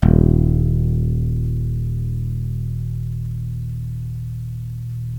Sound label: CHAPSOLO, CHAPMANSTICK; Greytsounds